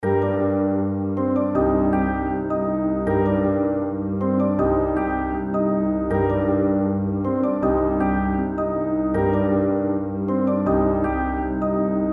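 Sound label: C minor 158 BPM Piano Keys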